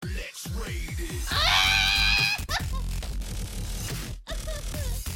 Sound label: drops the bass